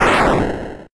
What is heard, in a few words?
death; Duck Game